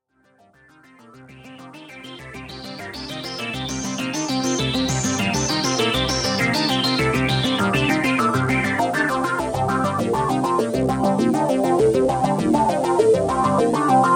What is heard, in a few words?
Sequencer